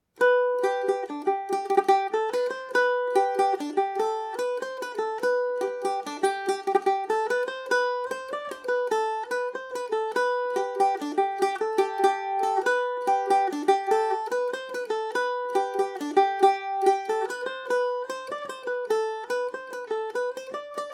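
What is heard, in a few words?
G major, Irish mandolin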